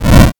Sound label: fire